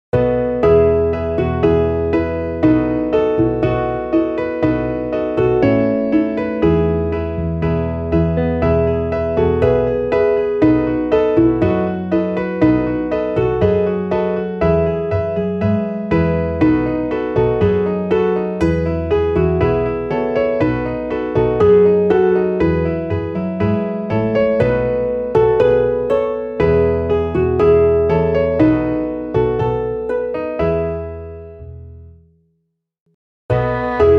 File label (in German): midi klavier